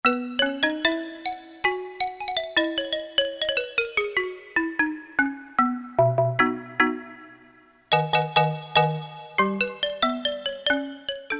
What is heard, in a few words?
XYLO